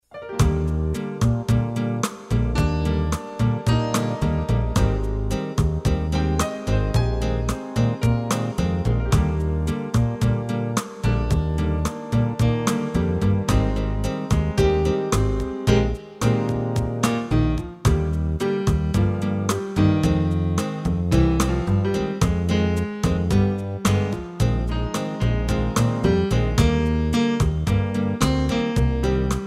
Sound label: Pianist